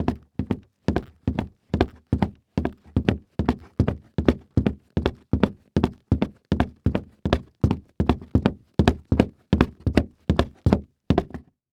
Foley Footstep Golf Cleats Wood Surface Fast Walking; Foley Footsteps